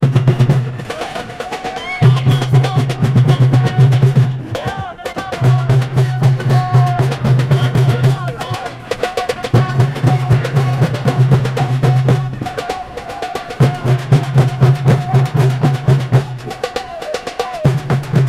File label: protest war drums native dr; percussion